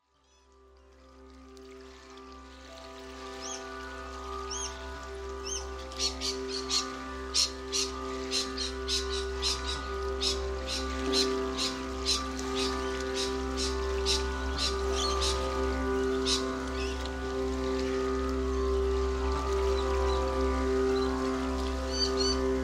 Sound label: Lake Birds